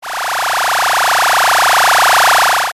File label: laser; ninja gamma